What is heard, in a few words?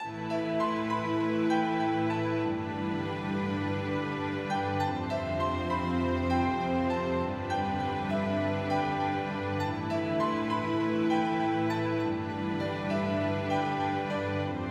INSTRUMENTAL